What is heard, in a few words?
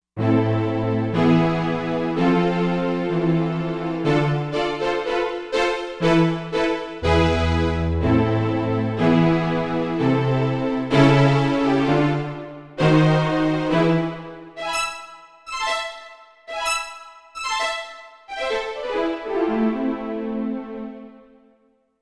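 Agitato, synth strings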